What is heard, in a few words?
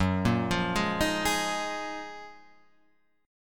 Gb Major 13th, Guitar; GbM13 arpeggiated; Standard; JGuitar